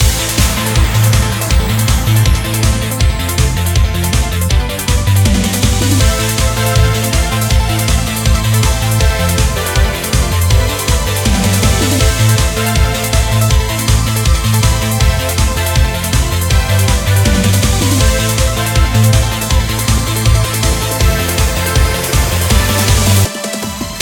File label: Battle Theme; Hell's Chicken Battle Theme B